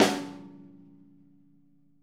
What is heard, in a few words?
snare, Interactive Drum Kits; Sonic Reality